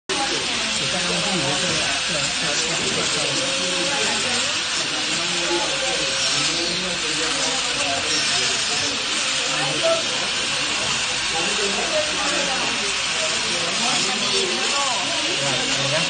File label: Power tool Hoof trimming; Merlin in use